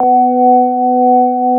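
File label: CX3 organ B3 L, Keyboards Old School; USB Soundscan vol.09 - Keyboards Old School; AKAI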